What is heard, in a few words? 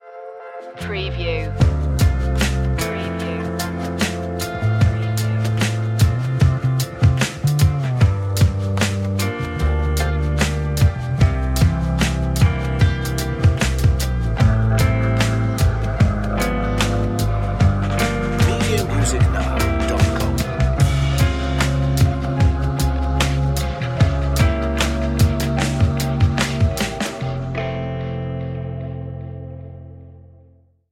chill cafe